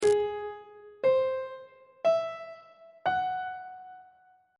a flat augmented 7th chord intervals on treble clef